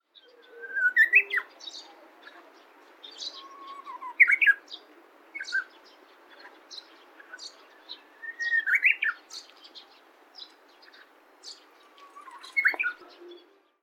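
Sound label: uguisu, Japanese bush warbler; saitama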